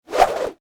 Warfare Whip Movement Slow; SFX Bible 6

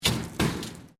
METAL SHEET MOVEMENT

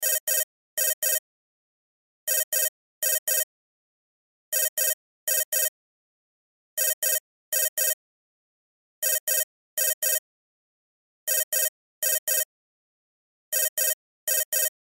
Cell Ringtone; Loop; Cell Phones; Blastwave

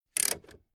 Old Tractor Handbreak Pulled On Hard Single; Transport

